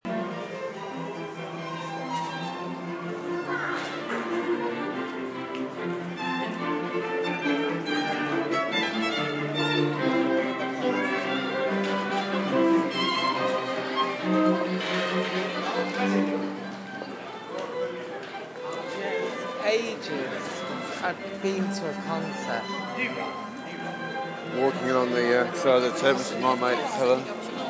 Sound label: southbank strings